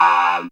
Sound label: synth, Dance Synths Trancers